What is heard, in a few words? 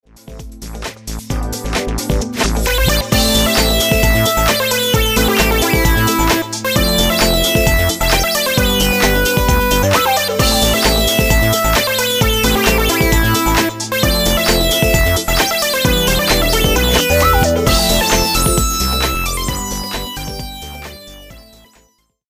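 synth lead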